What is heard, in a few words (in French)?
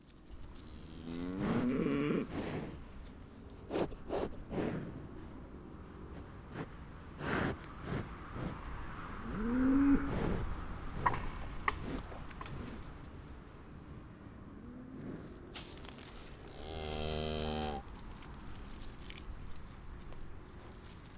Quelques vacheries, VITRY EN ARTOIS; PAYSAGE SO NORD